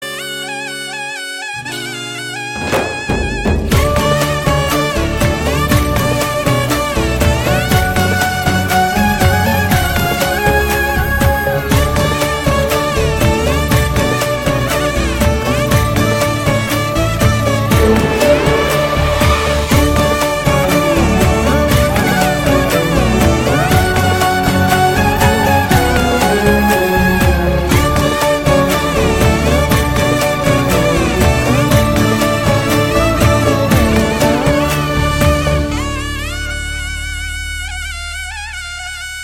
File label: instrumental